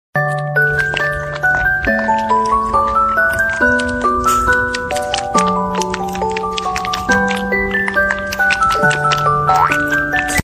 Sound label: Tone